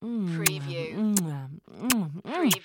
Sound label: Kiss Moan Multiple; Sound Effects Bible